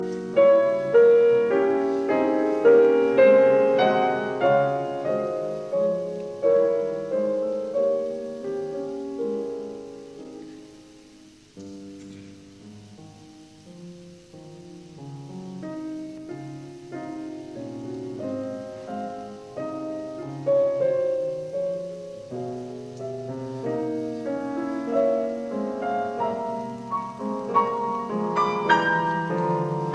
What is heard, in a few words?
Variations in C major on a Waltz; 33 Variations; Op 120; Variation 30; Andante